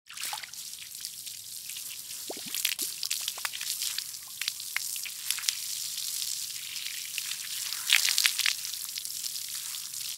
gardening pipe; conservation of water

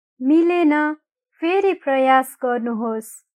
incorrect

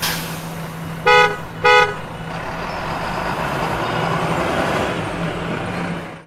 bus ride; dyslexia; ra kha

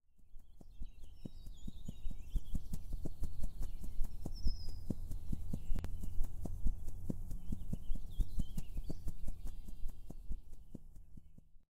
BIRD; grade1; english; preposition

